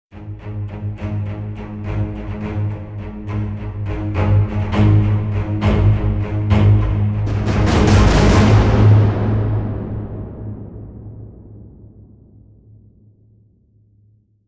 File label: magical trailers transition; sound adventures